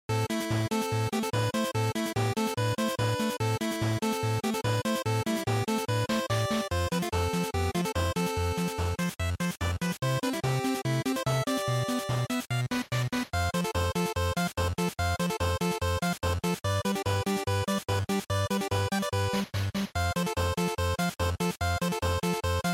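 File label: Game Boy Action Stage, bgm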